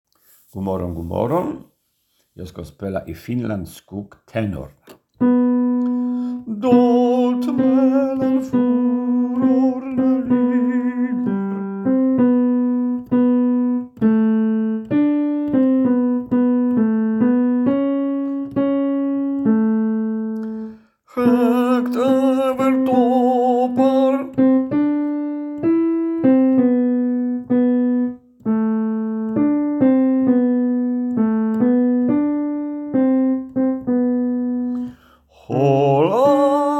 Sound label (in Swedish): tenor